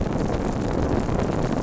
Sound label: firewall; allods2d